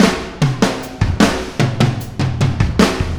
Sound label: Fill, Full Mix, Drums; Sonic Foundry (Sony Creative Software); Drums from the Big Room The Mixes; Demo Projects